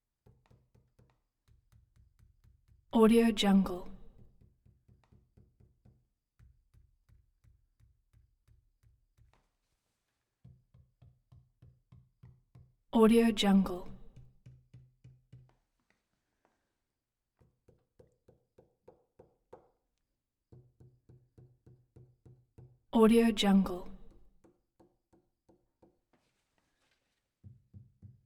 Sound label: finger tapping on wood